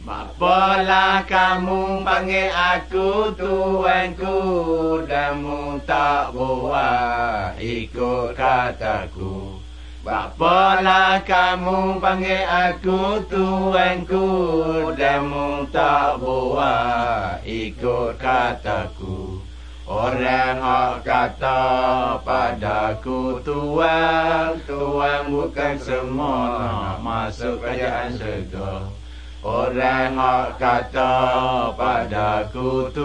songs malay pattani

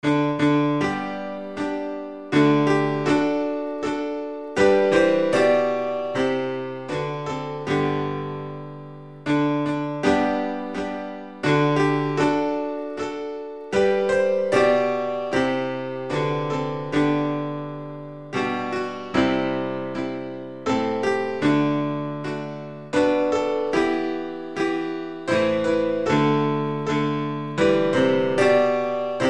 infant holy infant lowly, instrumental hymns

